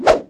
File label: preattack; weapons; dota; brewmaster